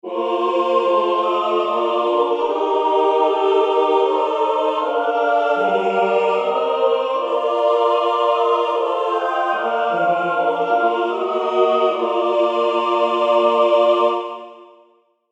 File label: chorus; yasuragi